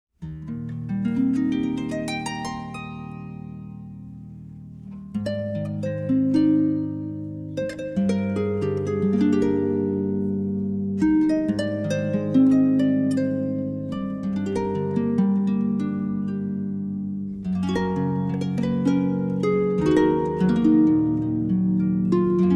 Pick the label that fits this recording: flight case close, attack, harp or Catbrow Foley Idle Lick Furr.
harp